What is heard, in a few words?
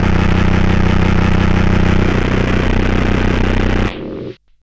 Car Trash, Hard & Loud Techno; USB Soundscan vol.01; AKAI